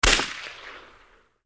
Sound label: gunshot; riot